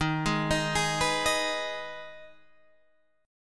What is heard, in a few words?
Eb Suspended 4th Sharp 5th Eb Guitar Half Step Down; Ebsus4#5